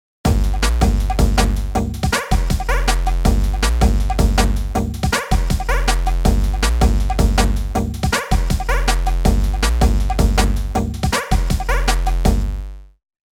Drum n Bass; Zoom AR 48

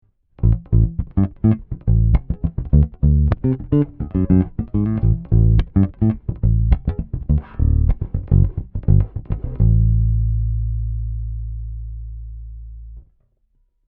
Markbass MB58R CMD 102 Pure Fingerstyle DI

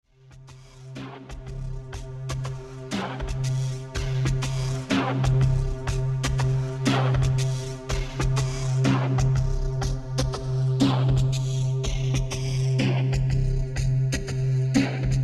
Drumloop Comb Flt

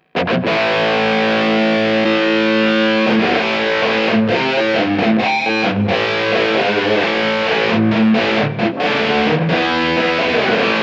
Pitch Shifter Mode Doubler

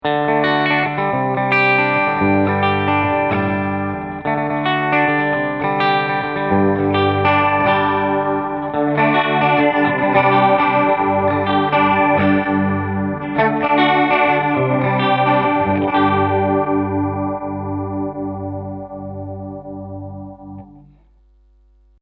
Detune Deep

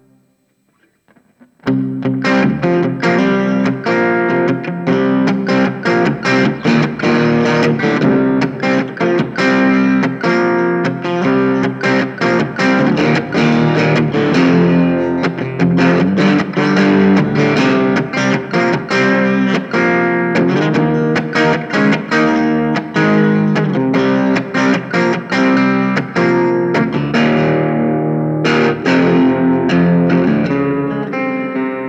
AC15 Crunch

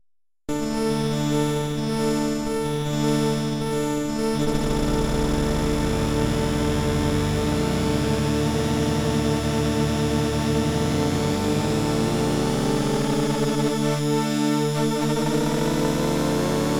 Quadnic Unison; Studio Electronics; 2xMul

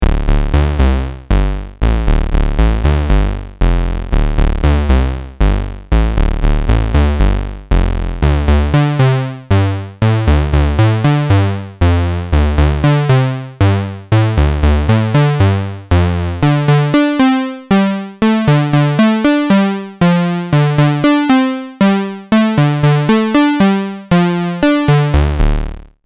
Cascaded VCA lin Moog; AJH

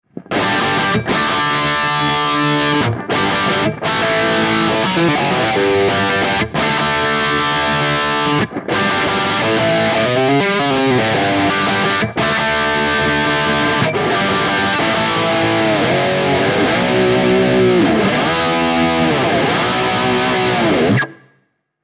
Mooer Preamp Model X Drive Ext Cab Sim

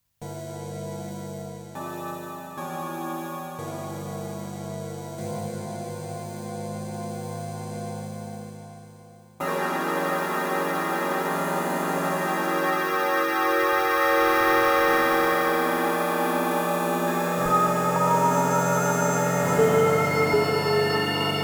Lexicon Alex Large Hall

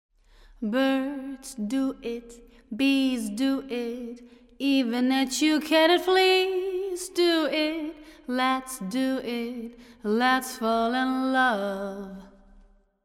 London Plate

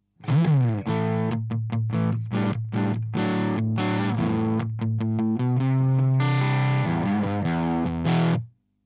crunch Position 3 SM57

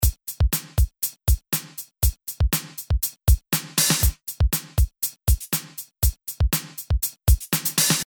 Audified Synergy R1 Drum Loop clean

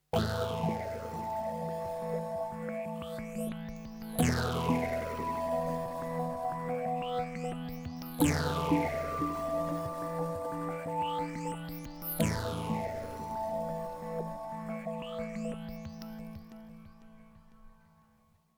iridium kinetic dry

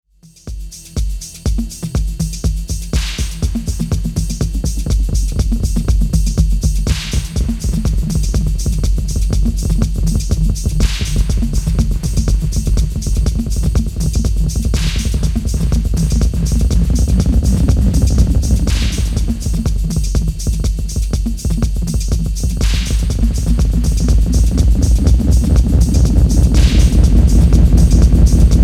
Tap Feedback Time

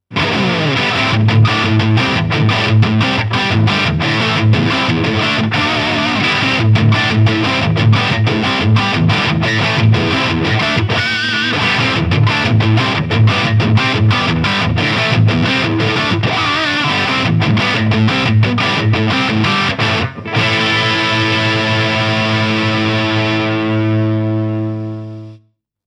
Lead; Chapman Guitars Bea

